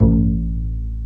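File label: STR Cb Pizzicato, strings; Roland L-CD702; Cb Pizz 3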